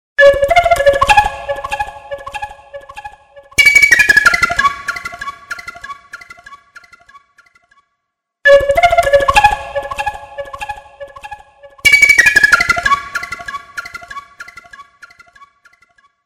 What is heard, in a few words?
Mouse, ringtones; Coolpad